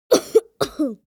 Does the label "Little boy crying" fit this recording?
Yes